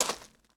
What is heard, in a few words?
Foot Gravel Shoes Walk; Lucius III; pc linux